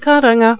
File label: karanga